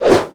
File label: down